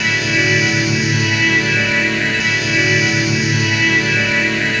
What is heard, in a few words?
Orchestral Burnout, Strings; Sonic Foundry (Sony Creative Software); Myths of Technology